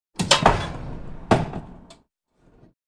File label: Kitchen oven oven door open